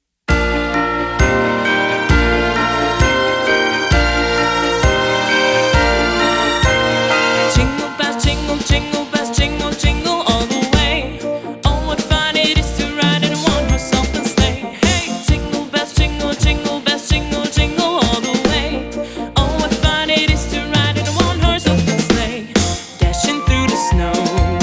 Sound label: Jingle Bells Drums; Christmas Drum; The Christmas Drum Book 2